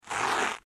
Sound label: Quick Velcro Rips